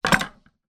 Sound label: tennis racket place down hard surface; sport